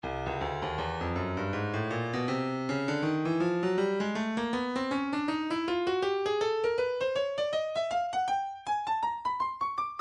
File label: breakbeat 080 bpm; Stylus Breakbeat Midi Files 080 084 Bpm; Beatnik B